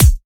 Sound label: natural bass drum one shot c key, Royality free steel kick drum tuned to the C note.